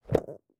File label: Close lid; anemoi